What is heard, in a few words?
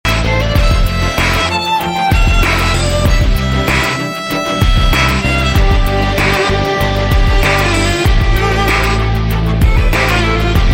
Violin Hiphop